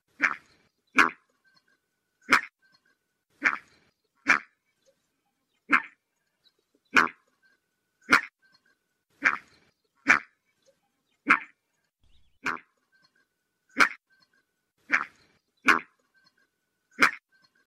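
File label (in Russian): drofa krasotka, bustard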